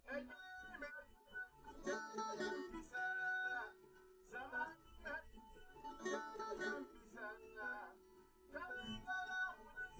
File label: no drums live rec 250ms 1m; SiSEC11; underdetermined